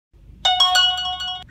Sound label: Request Tone; Lyft